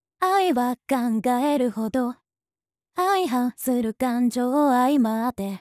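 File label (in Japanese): Synthesizer V, 調整前のバージョン, 歌声; Aメロ; Synthesizer V Studio 2 Pro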